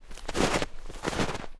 alien Move; feed the frog

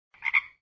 frog

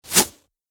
arrow miss

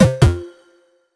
feedback Incorrect; robot collector

